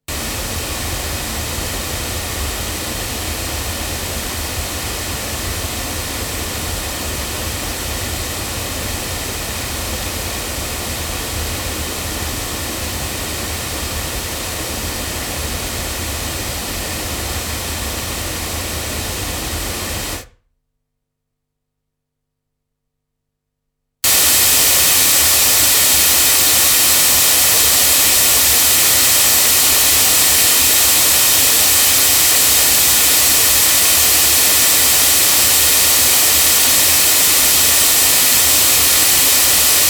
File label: Oktava MKL-5000 cardioid loudspeakers